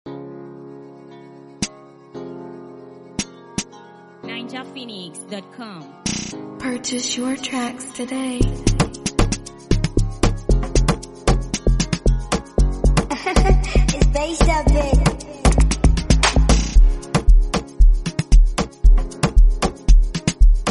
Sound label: Amapiano Instrumental